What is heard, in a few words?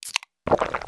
soda sip